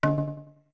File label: Drums D2 short; Goron Drums